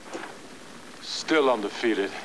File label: firestorm